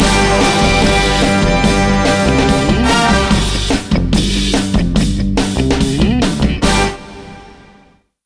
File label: Win; Tanktics